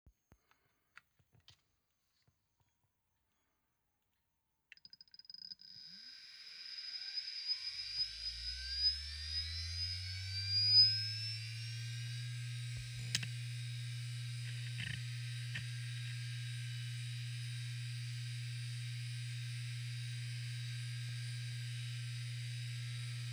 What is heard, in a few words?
IBM DFHS SCSI 4GB Power On Off